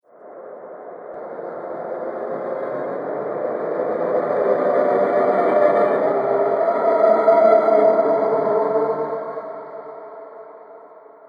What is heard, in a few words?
Horror Possession, evil; Evil Sound Effects